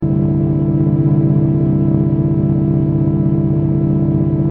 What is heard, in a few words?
light Beam Loop Eq Out Bass; wave interference